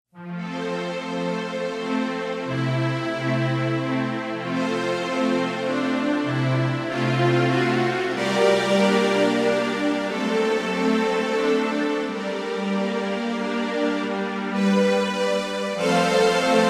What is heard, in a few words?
strings